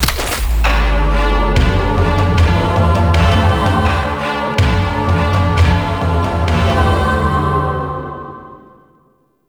Tower Music Phase; Warframe; Bard Quest; Orokin Tower

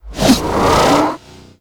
Spear Gun Throw Charge; Warframe; Tenno; Hoplite

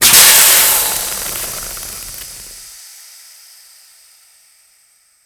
Death Gas Burst; Warframe; Grineer

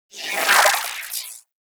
Overcharged Gyro Move; Warframe; Warframes; Gyre

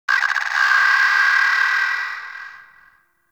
Night Bird Screech; Warframe; Eidolon Plains; Ambience

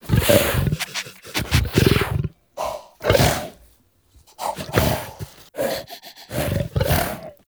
Juggernaut Truffle Sniff; Warframe; Infested; Truffle Hunt

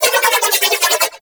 Nunchaku Prime Quick Spin Whoosh; Warframe; Tenno

